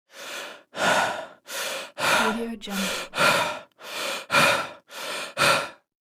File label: heavy male breathing; pure-noise